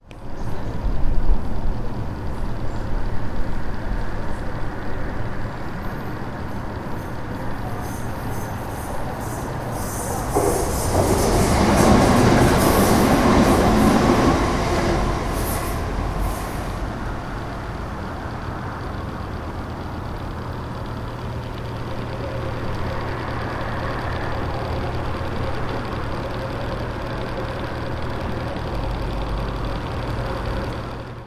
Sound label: air vent in Old Leigh